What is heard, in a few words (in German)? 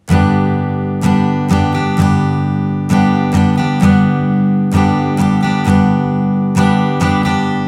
Schlagmuster